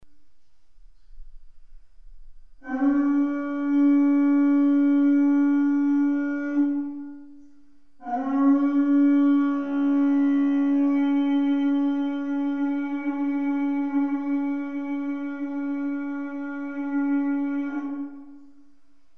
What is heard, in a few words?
medium horn